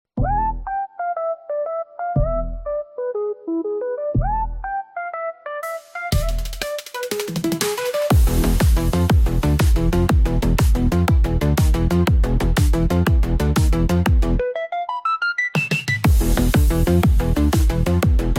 EDM; FL Studio; Public Domain; playing in immersive CRT

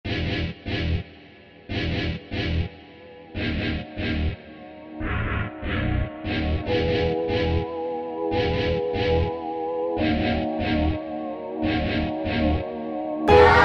Live Version Guitar remix

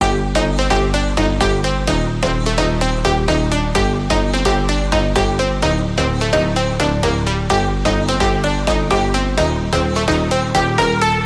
FUNK

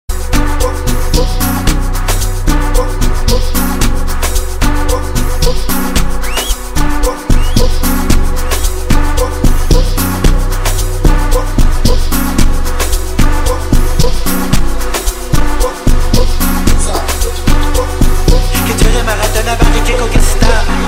amapiano; amapianodance

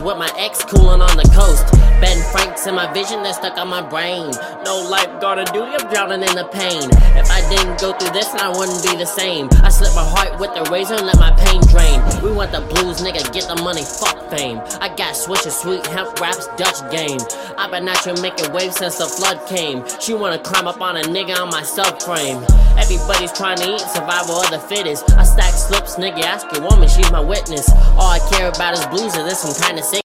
Rap HipHop

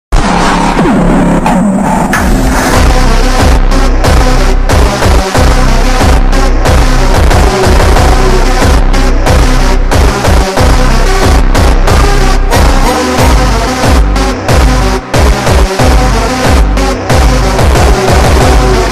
Phonk

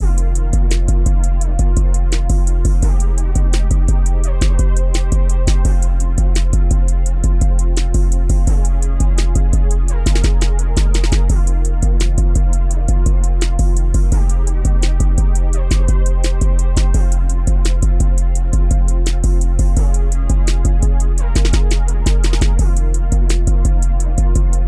Instrumental

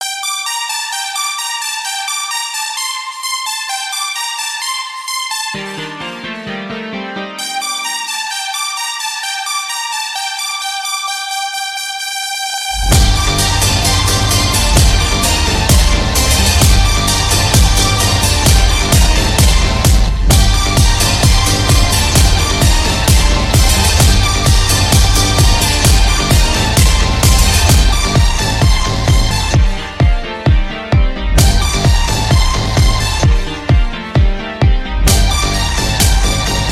Sword Phonk Funk

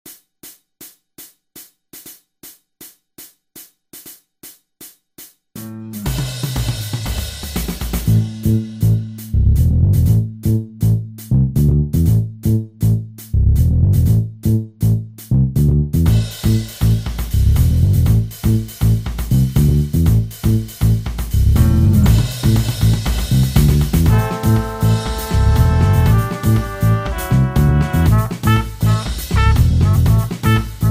Bass

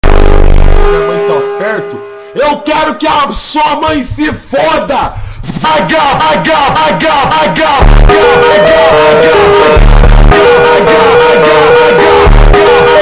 FUNK Slowed

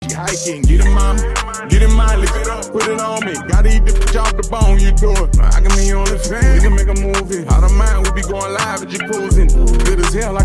rap hiphop

